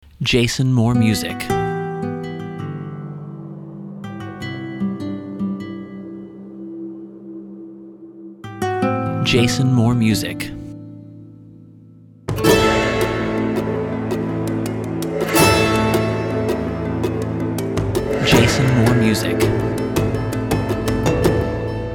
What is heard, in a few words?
Desert Wind